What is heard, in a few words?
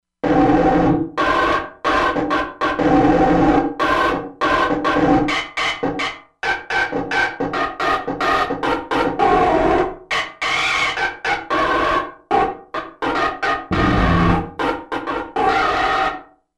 Scratch; Korg MSC-16S Environment